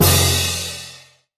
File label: clash